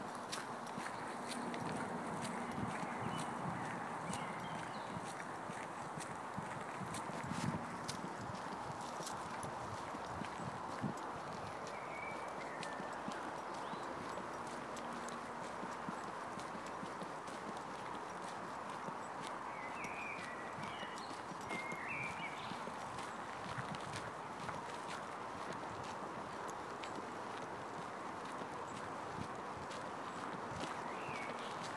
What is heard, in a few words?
Birds Singing In Snow